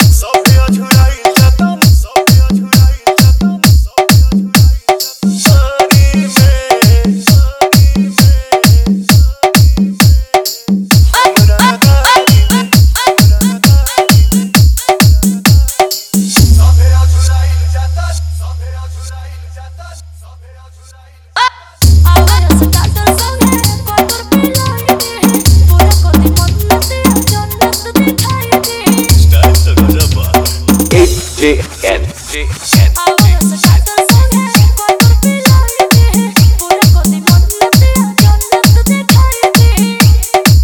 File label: Dj Hard Bass Mixx, Bhojpuri Dj Song